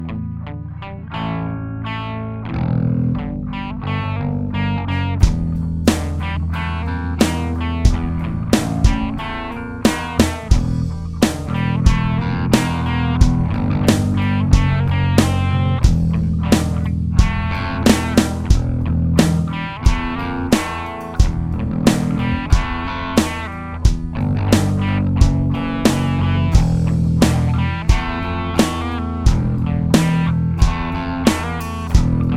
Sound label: some new hippy music trig drums